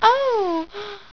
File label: nymph